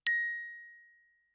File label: bells plastic ff Bb6; Percussion